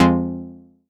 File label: stab pluck, stabs